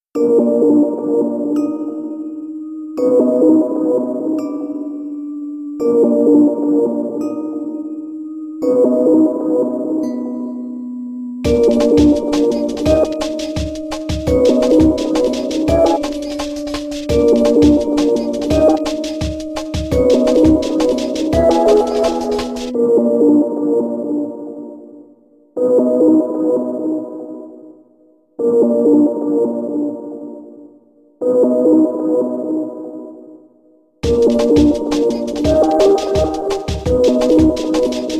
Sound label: Zen Beacon